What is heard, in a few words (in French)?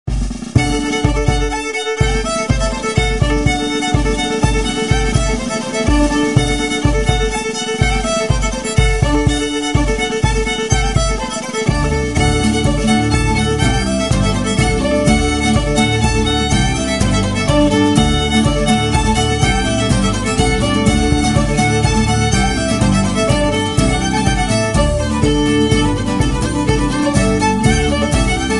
Polka; pas piqué